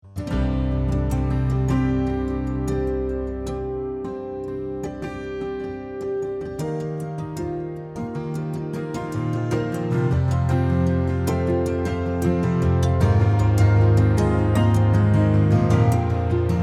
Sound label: Harp; Plectrum